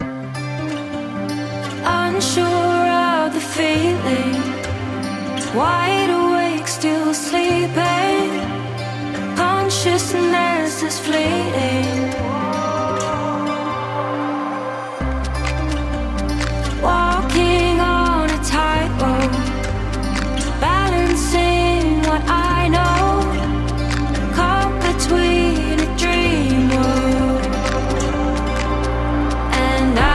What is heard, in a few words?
Construction Kit Full Mix; sickrate siik essentials iv